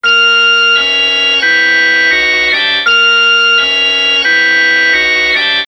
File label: organ; Left 4 Dead 2